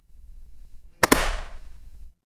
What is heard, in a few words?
office stapler